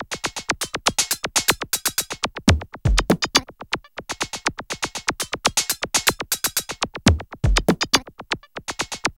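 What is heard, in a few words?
drum loops, right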